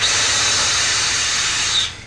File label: steam; RenderOrgan